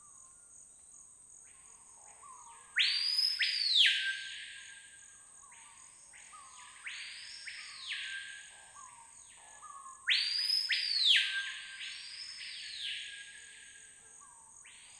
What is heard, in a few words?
Screaming Piha Clean; Passive Acoustic Sensing; Aliasing demo; Screaming Piha Clean clip; CornellWorkshop2017